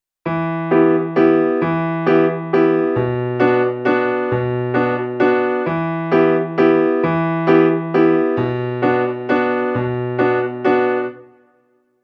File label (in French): valse